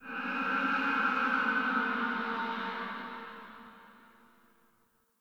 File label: Pump Shotgun Reload Void Layer B; Weapons; Warframe; Zariman